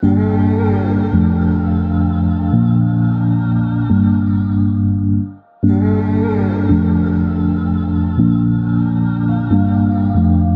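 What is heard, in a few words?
85BPM Gm Synth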